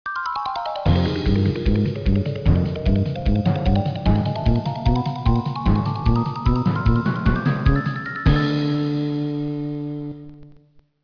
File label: xylophone